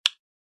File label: click